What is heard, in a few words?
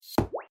SMS tone